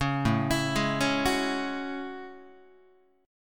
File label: A Major 13th Guitar; AM13; arpeggiated; JGuitar